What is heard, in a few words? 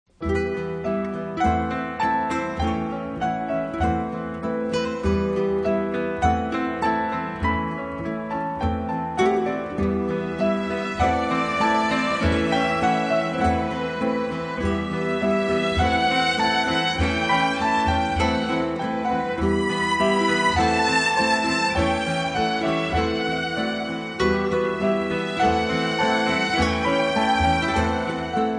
Orchestra, Strings